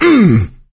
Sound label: hurt minor, survivor voice; Left 4 Dead 2; coach